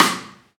present snare drum sample D# key, Royality free snare drum tuned to the D# note.